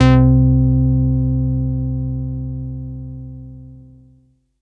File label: SYNTH Brassy; Board